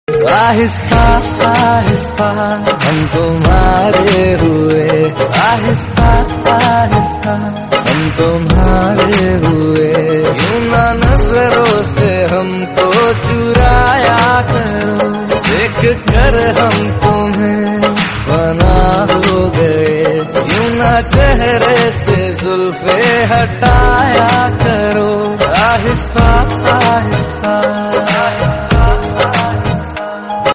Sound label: romantic hindi